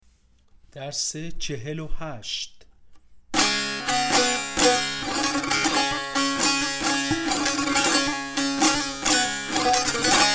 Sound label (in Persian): مشق تنبور